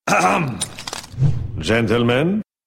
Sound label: ahem